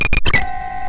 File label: shield init, technology federation